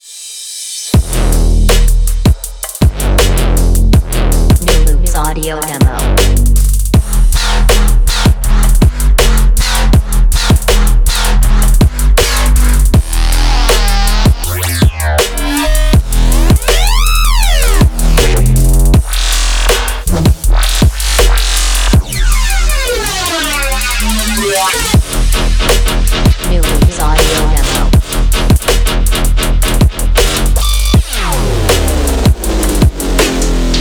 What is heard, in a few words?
hard bass trap